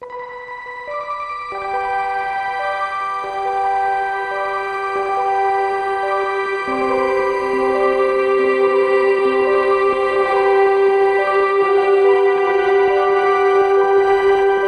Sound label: Ambient Dub